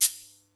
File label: Yamaha EX5, Drums